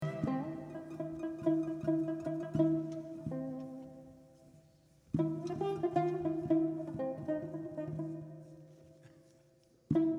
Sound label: Tanbur Taksim